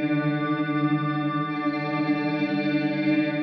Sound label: Lap Clean FX 140 D, guitar drone